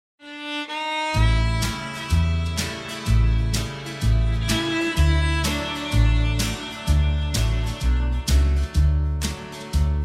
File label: (D) no backing vocals